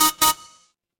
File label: feedback success